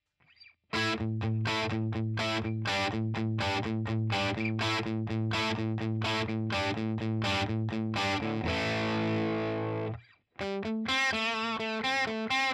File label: EL84 DIST; Boss Nextone